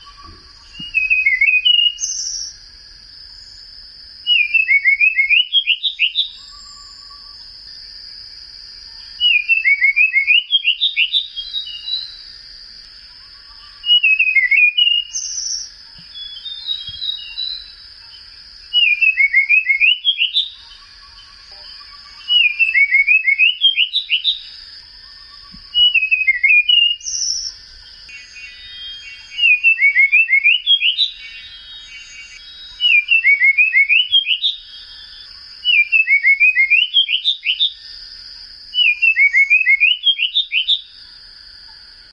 sikatan biru muda

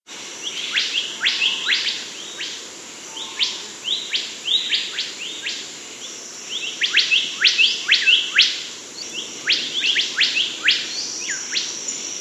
burung bimoli

